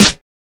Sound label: 00s short reverb acoustic snare g key, snare drum sound tuned to the G